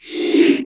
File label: CYCLOTRON; Distored Reality